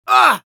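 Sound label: Demoman pain sharp, Spanish; Gruñido 1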